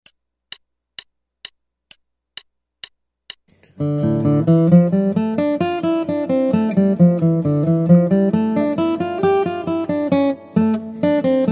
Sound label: Guitar